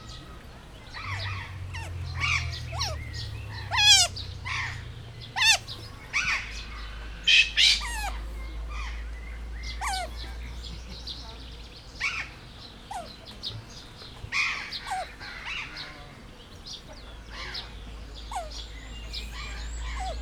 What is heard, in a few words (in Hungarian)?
szerényen és hangos papagájok, ázsiai kiskarmú vidra; jaszberenyi zoo 2016